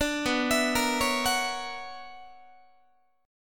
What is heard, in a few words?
B Minor Major 11th, BmM11 chord, Guitar; arpeggiated; 17,19,19,20,17,17; JGuitar